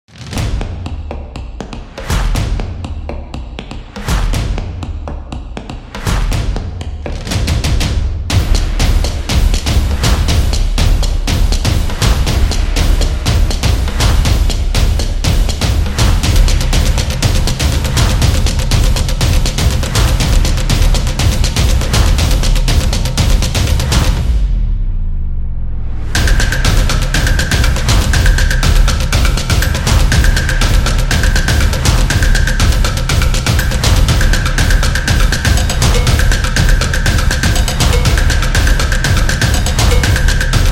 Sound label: Stomps Upbeat; graffiti